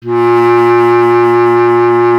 B. Clarinet

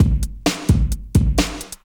drt 130 electro, DRUMLOOPS, LOOP ELECTRO

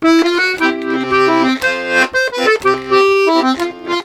120 GIGUE, Complete Accordions; USB Soundscan vol.40; AKAI

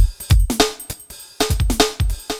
drloop, drumloops, Vinyl Attack; Masterbits

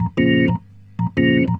Roots Reggae, B-3; Sounds Good

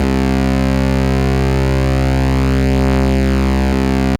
FAT PWM; AMG - Vince Clarke Lucky Bastard

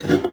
GUITAR FX; Xsample VOL-2

